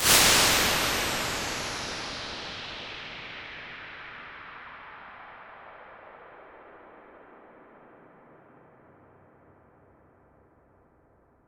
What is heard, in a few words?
reverb; xlarge